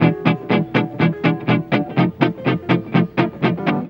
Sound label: EGUIT GROOVE; Best Service; World Colours